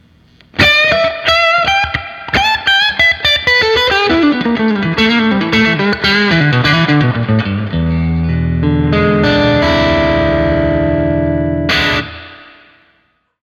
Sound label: 1952 middle